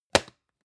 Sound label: plastic can small lid close hard